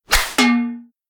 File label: notification; samsung Aleart